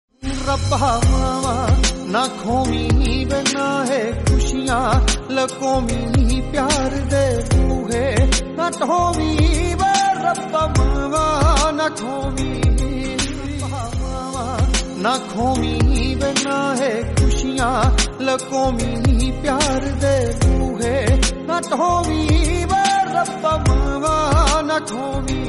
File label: punjabi song